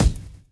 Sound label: drum chop; AMG Gota Yashiki Groove Activator